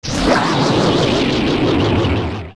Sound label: Die; Bongo Bongo; zelda; OOT